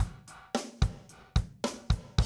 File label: Classic DrumLoops, 110